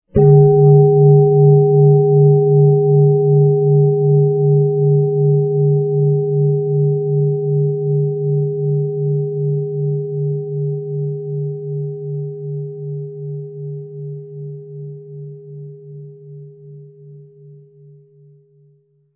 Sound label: gong, zen